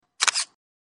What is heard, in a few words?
camera click; China Mobile; A4s